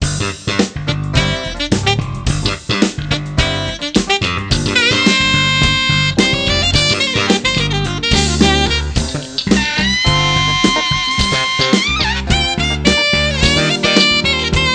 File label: t rnb